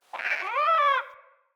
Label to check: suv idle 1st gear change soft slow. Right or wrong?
Wrong